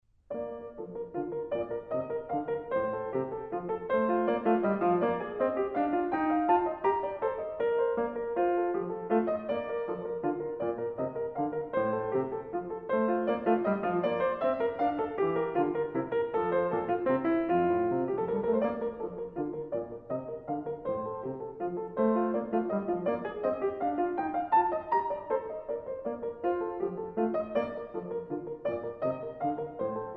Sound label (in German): partita1 b dur bwv 825 menuet i